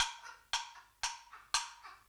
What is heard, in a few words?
block